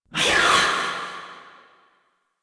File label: Unyielding Gallop Cast Vocal; Warframe; Dagath